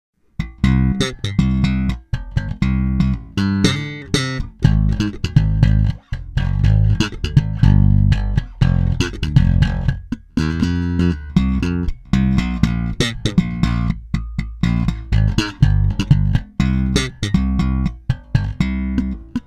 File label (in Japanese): Bartolini弦新品ver, ベース; 弦新品＋電池新品; バルトリーニ